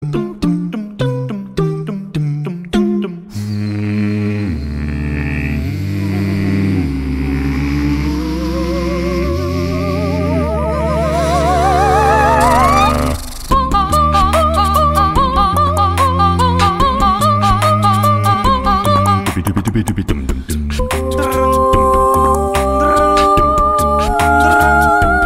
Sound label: theme Acapella; GTA